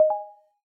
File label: Notification